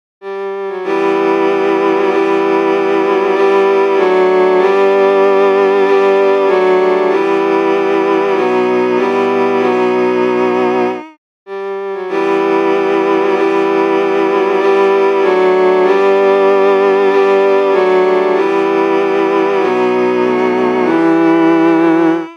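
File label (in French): sans voix solos accompagnement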